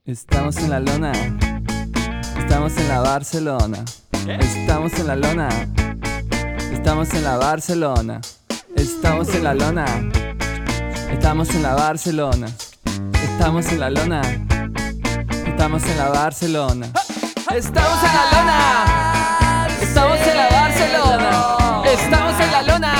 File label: Radio Barcelona Funk